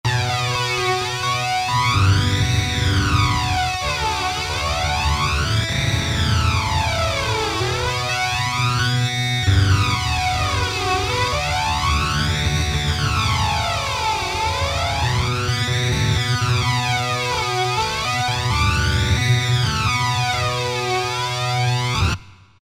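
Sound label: FLANGER guitar, Roland SC-880; Factory Patches; Preset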